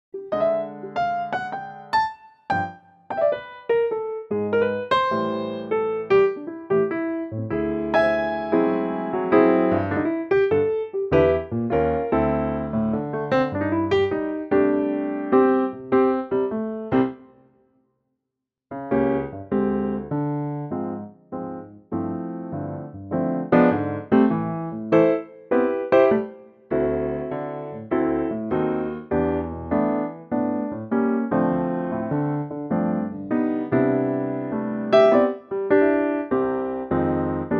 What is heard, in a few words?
C piano